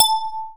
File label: digi decay